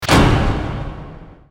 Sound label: point; mystery room